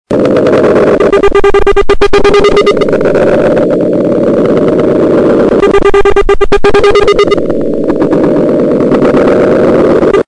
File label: echo